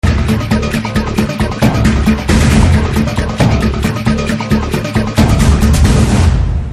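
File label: Trailer background Tone; Theeran Adhigaram Ondru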